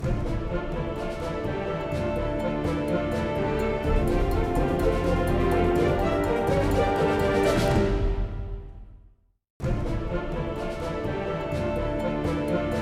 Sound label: Music nature combat start; Kick & Fennick